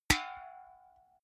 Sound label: big can empty hit